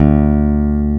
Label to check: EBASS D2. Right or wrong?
Right